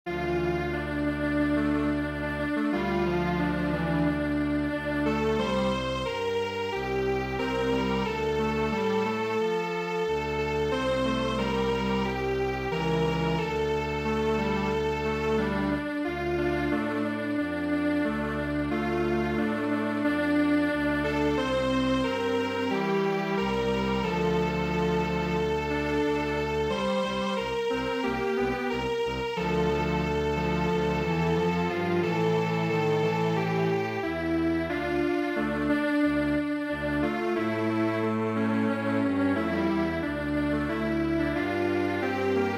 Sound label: Strings Quartet